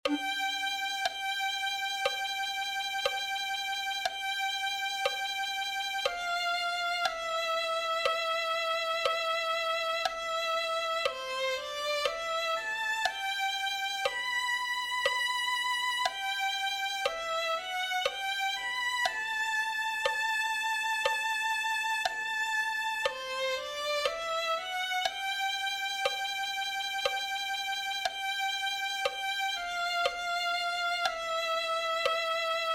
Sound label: violin